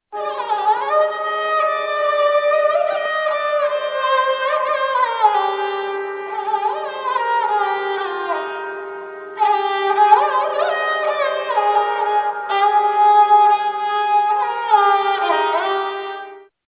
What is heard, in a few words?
sarangi